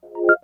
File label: wheel; Samsung SGH-i907 Epix